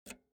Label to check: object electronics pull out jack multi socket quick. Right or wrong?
Right